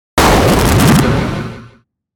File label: Descend Impact Warble; Descending; 5.1 STEREO MIX; Blastwave